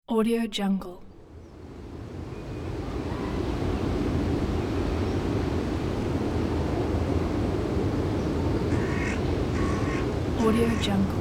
winter forest ambience

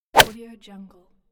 five iron golf club striking ball; sound ideas

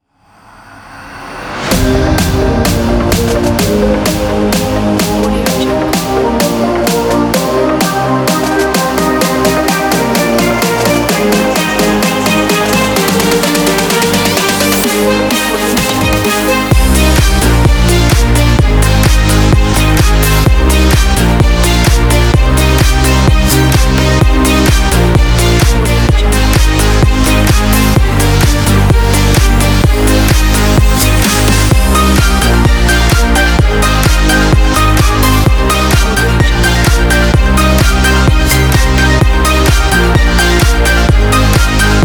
uplifting motivational edm

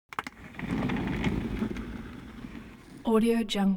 object chair office worn trundle long; smartsoundfx

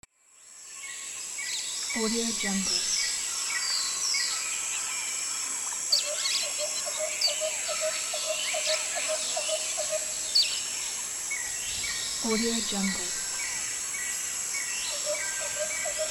jungle ambience

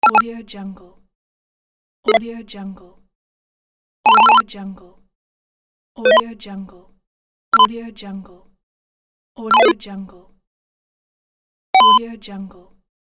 classic 8bit game

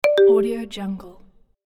marimba alert notification

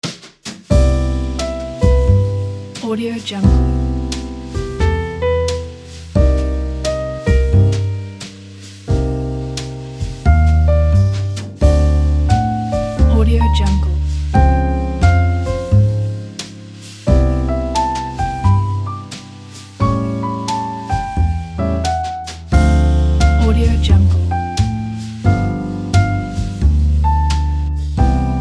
cool ballad jazz piano

